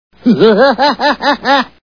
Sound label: laugh; krusty; Simpsons